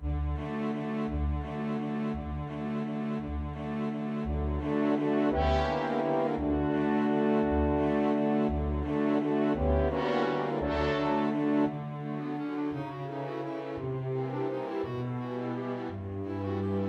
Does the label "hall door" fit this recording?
No